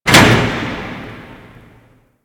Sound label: Pod Lift Stop; Elevators; Grineer; Canno Pod